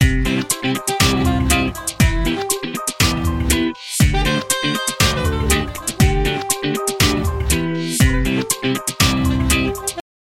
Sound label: Classic Ringtone